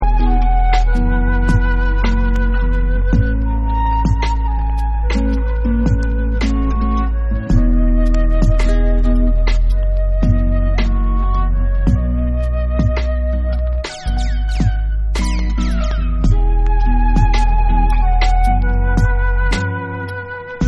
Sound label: PIANO SONG